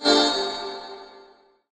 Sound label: pickup health; soldiersfury